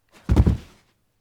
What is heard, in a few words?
Body Fall